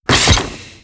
freezegun fire; varia cc0

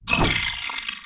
ghost armor attack; megaglest

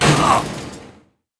machine die; megaglest

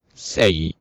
swordman ack; megaglest

